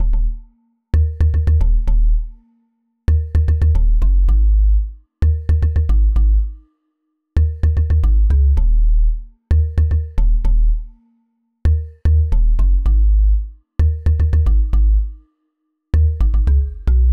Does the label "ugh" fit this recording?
No